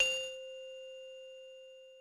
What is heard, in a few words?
Instrument